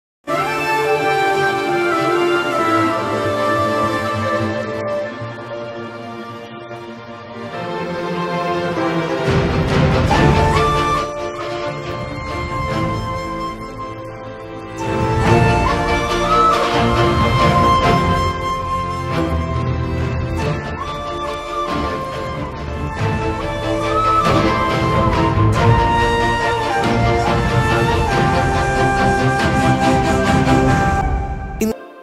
trailer bgm; dada

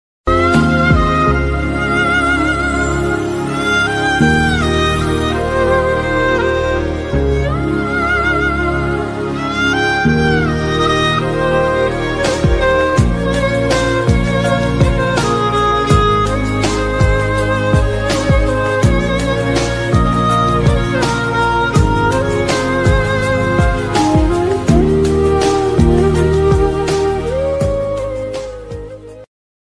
sad instrumental ringtone only music

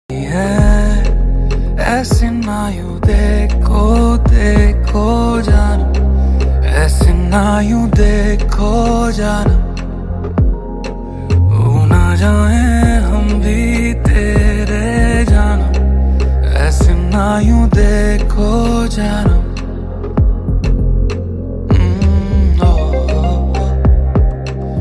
music song love dance bollywood

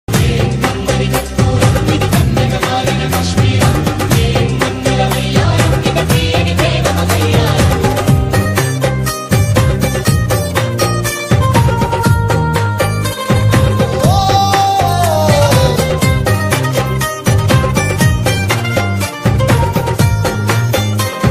new telugu song ringtone; film gabbar singh

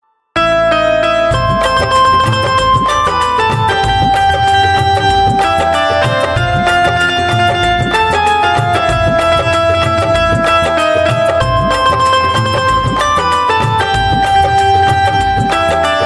desh bhakti ringtone; independence day ringtone; 15 august ringtone; happy republic day

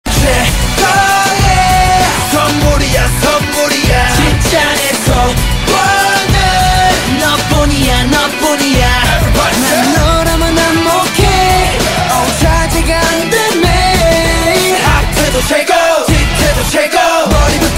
ringtone chorus part

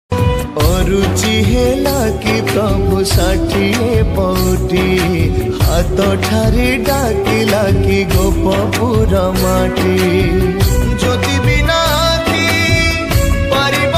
odia jagannath bhajan

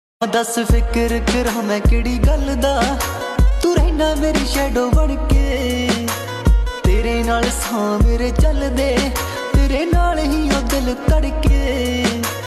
new punjabi song ringtone

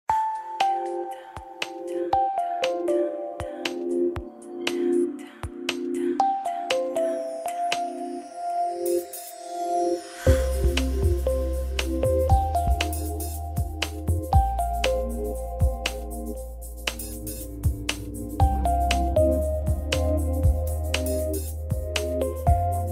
samsung tune samsung galaxy s1 ringtone; high quality

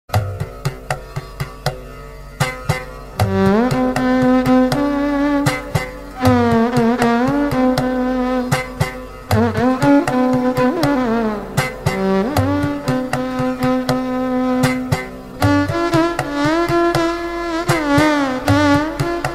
violin ringtone

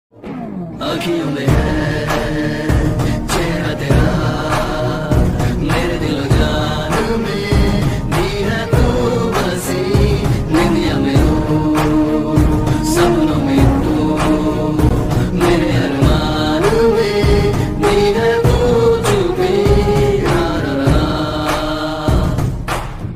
romantic ringtone; sita ram

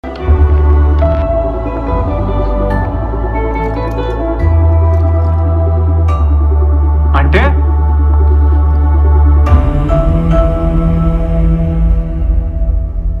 love bgm ringtone, sad bgm ringtone; the family star movie

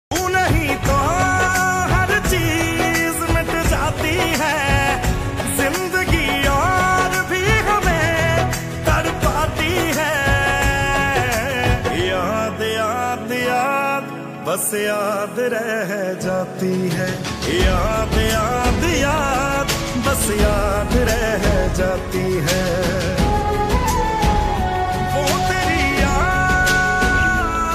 song

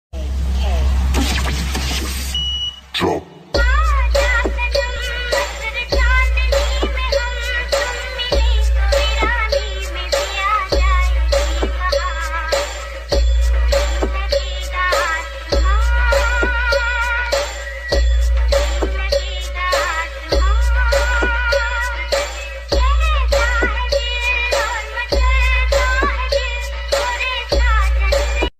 remix ringtone mobile ringtone

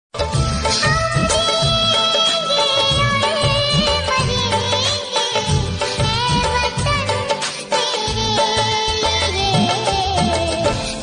female version ringtone; 15th august ringtone

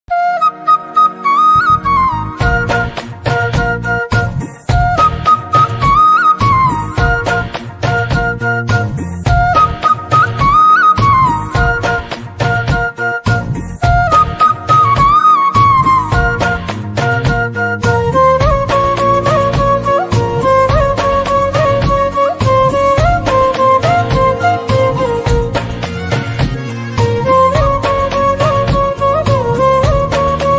flute, instrumental

